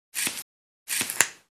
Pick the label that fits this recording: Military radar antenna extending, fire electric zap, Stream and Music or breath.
Military radar antenna extending